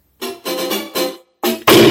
jingle

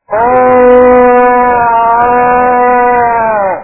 fox ahh

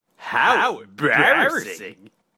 embarassing; cave

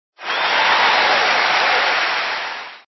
crowd applause; cave

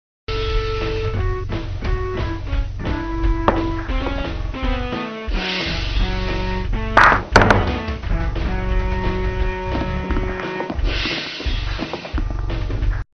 virtual pool

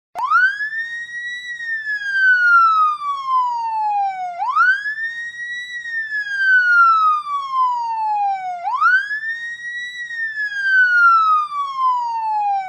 police siren

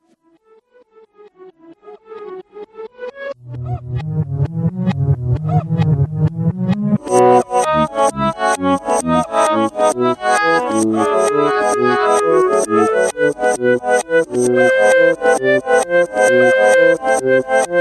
march; reverse

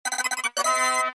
race fanfare

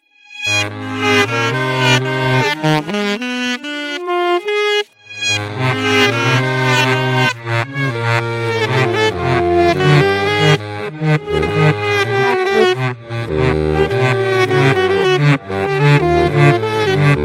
sax

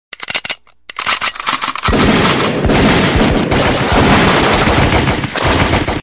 gun; cave

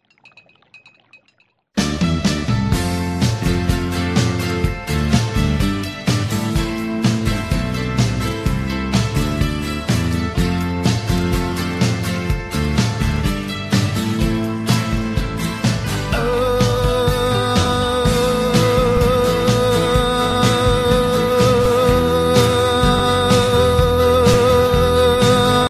ooohhhhh

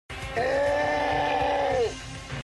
OOOOOH

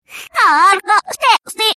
clock, reverse

